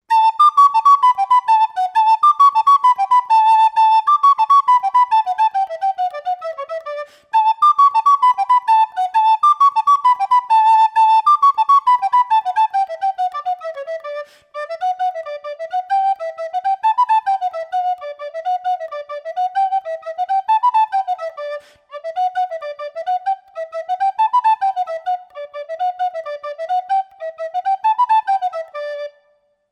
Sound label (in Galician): trad, Pito Galego